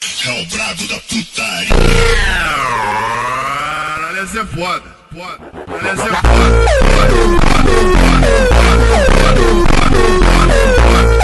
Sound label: montagem slowed reverb